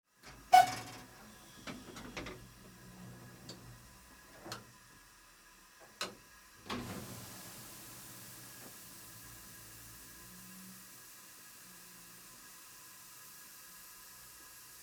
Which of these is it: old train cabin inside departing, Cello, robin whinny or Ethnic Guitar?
old train cabin inside departing